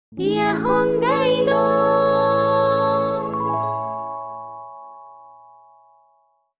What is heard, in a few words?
jingle